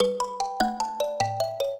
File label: trio, mbira; Mozart